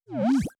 sine wobble, bass

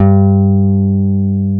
FEND P-BASS G2; Sonic Reality - Vintage Timetraveler

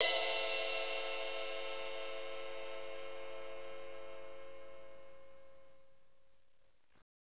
CYM F S RI1K, Drumscapes Roland; Northstar; Fast Shuff

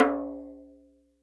tom rim mf, hand drums; SoundBytes - Early Patches

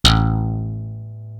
SLP BASS; East West; Global Sounds; DIGIBASS

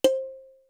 R8 PERC; Greytsounds VOL-1

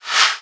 Reverse FX

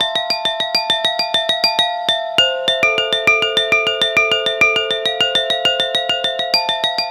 Pelog Demung Hi; Melodic; Ambient-Melodics-II

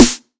909 Snr Sweet, Tape 909, SD; Goldbaby Tape808 & Tape909; The Tape 909

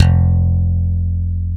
F 0 SLAP, SLAP BASS; AKAI S-Series CD-ROM Sound Library VOL-7; SLAP BASS 1

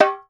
SKINNED UDU

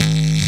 DC Bass High Pass, breakcore

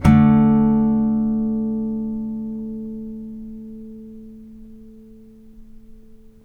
5TH D3, Blues Guitar Samples; ILIO; Fretworks

